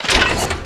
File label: Gun Weapon Pickup; SFX Kit; Pickups